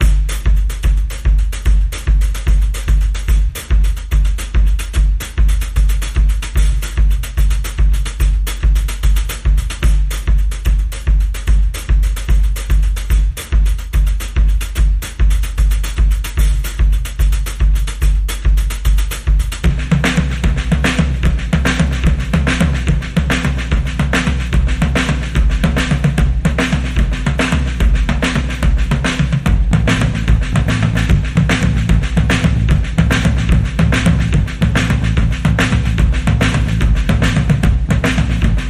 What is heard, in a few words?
Scary Action Drums Only